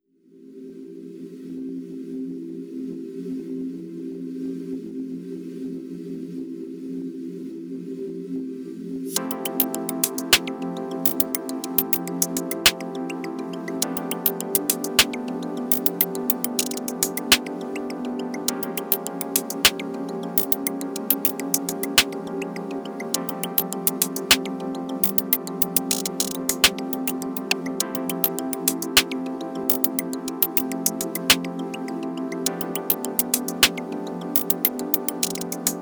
off vocal

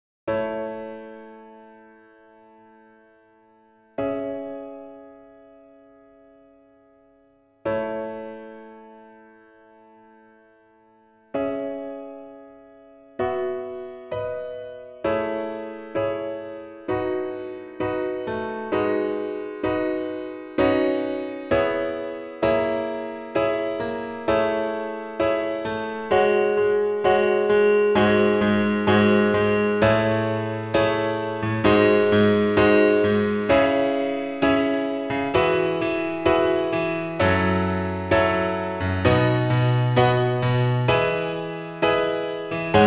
Piano

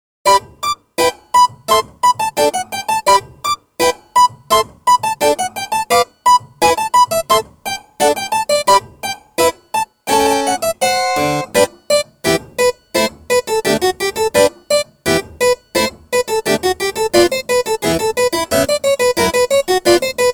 cembalo test

